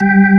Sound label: ORG, Organs